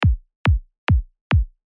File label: Kick